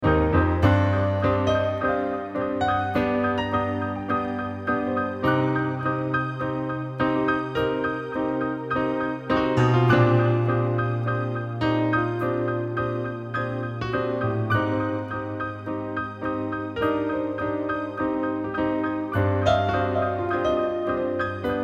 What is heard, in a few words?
Cool Jazz